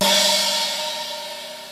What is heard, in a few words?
CYMBAL, GONGS CHINA; Crypton - DrumBase5000